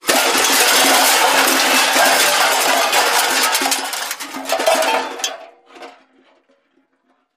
Junk Debris

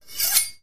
Kitchen Knife Sharp

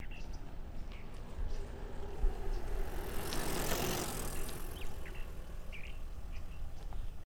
Mt Bike Coast By 15 Mph, bicycles

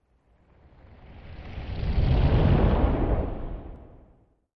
ASTEROID FLYBY, stingers & whooshes

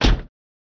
Car Door Slam Ext Hit S

